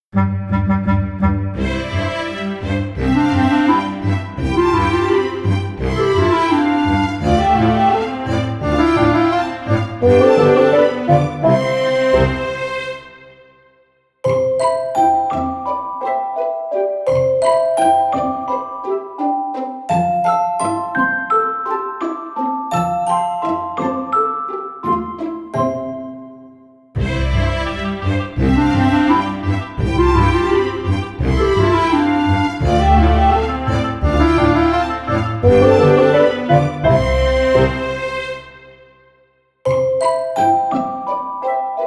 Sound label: Freezing and Melting Instrumental